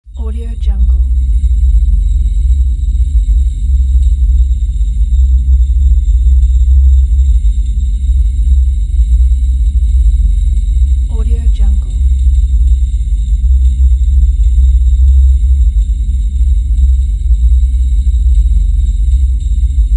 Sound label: low rumble